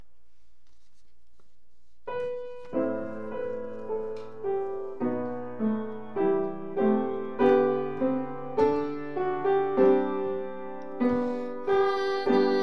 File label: adeste bassi